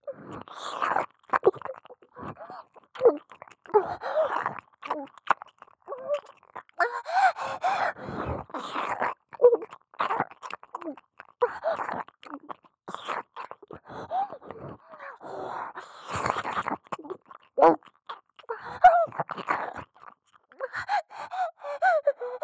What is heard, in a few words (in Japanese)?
喘ぎ 通常; A-04 対面座位・喘ぎ・通常; アスティリア